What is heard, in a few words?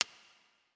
Button Menu Highlight; helltaker